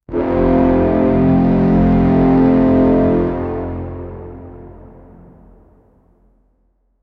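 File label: very heavy dramatic deep; alerts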